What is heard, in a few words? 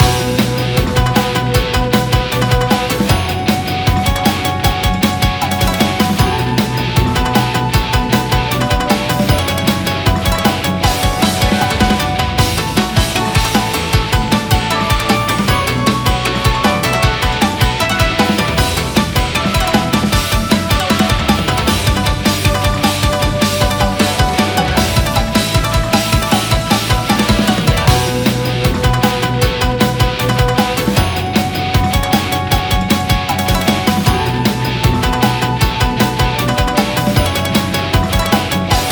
Japanese Battle